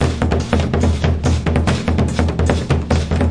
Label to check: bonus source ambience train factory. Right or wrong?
Wrong